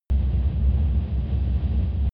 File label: drone spaceship rumble hard